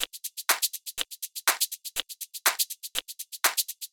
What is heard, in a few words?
122 BPM Tops, percussion; percussion essentials 1